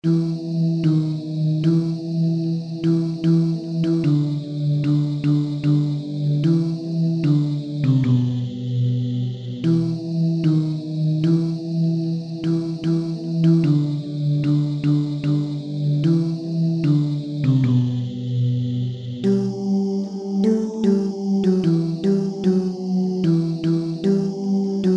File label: Bariton; Lully Marche